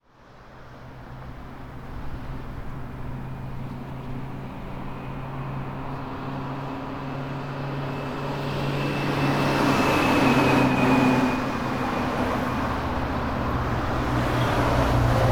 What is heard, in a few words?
Traffic; iLife Sound Effects